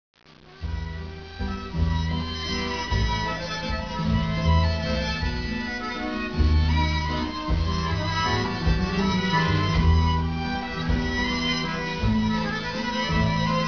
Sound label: Rumba